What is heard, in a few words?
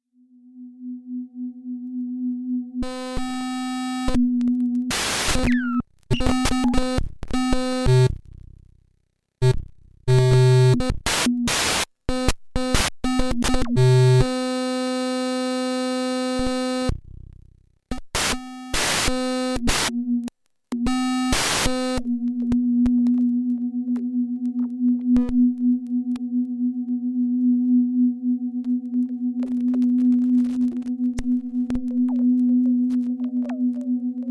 Roland Juno 106 Glitch from Bad Voice Chip